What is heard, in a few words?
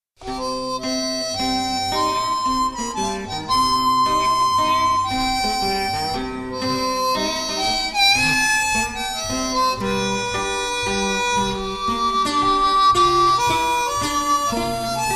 blues waltz